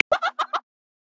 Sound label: Red-billed Hornbill; Xiaomi Redmi-Note-8-Pro; Africa